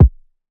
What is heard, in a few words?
Kick